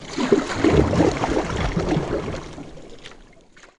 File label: Canoe Paddle Stroke; rowboat & canoe